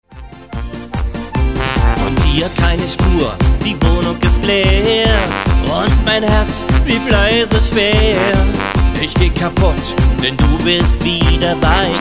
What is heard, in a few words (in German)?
oberkrainer